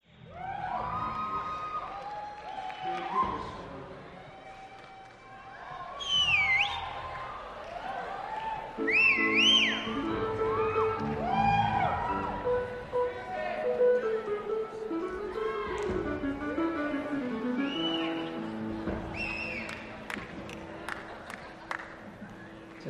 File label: Band Noodles Around